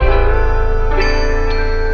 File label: keys voice glue stick, Phonky loops